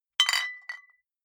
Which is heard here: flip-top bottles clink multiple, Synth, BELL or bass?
flip-top bottles clink multiple